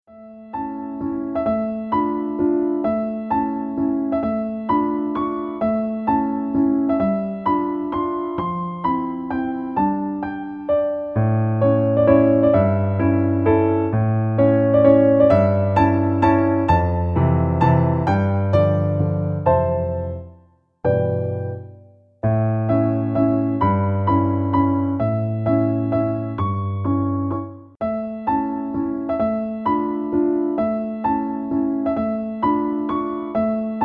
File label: music; powerdirector